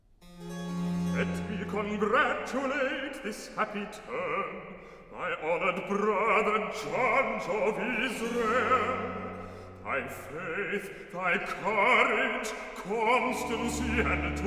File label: Recitative; (Zebul) Let me congratulate; Handel Masterworks; Klassika